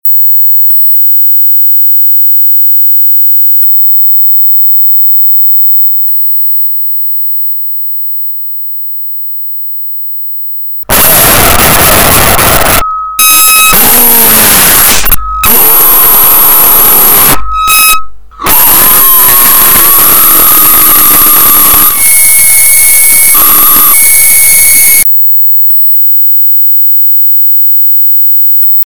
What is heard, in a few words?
ultrasound; nasa space sound recordings